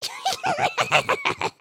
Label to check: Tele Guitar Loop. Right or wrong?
Wrong